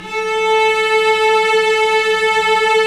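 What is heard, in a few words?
strings, mf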